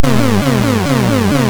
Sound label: Die Alien Slime, MOS6581R2